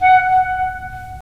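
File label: wind clarinet; LA Composer vol. 3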